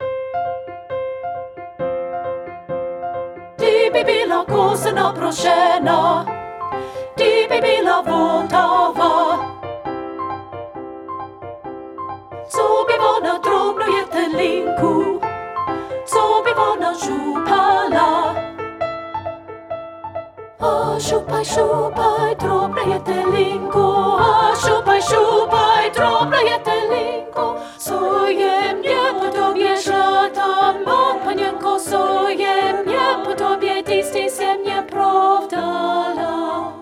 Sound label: soprano; soprano 2